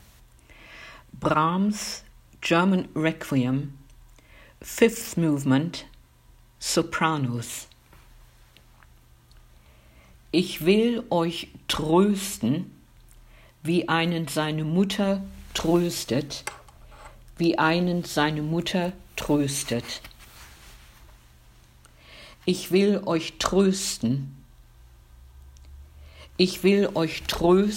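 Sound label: Sopranos; Brahms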